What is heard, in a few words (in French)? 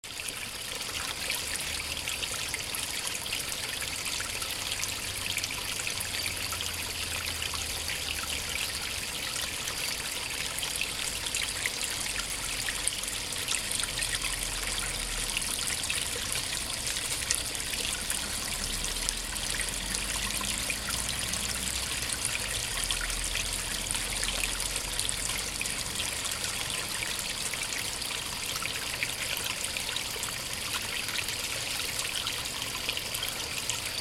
fond sonore eau